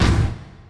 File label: BD, BASSDRUMS; DRUM SOUNDS